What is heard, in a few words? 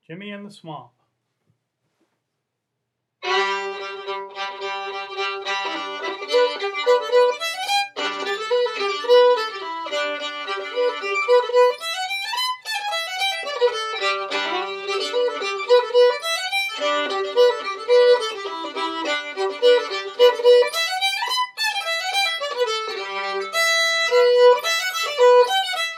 fiddle; festival tunes